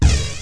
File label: beat, drums